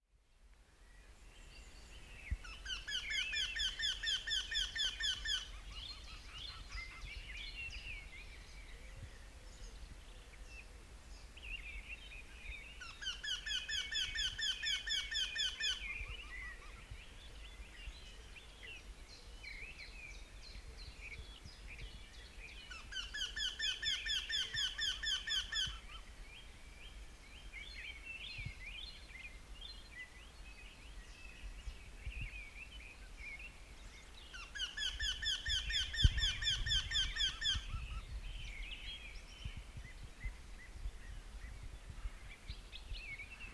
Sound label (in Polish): Jynx torquilla glosy ptakow kretoglow